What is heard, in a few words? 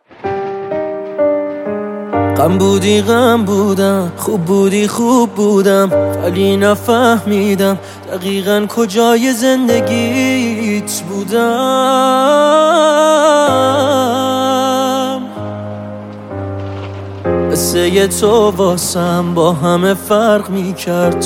piano version; Raditone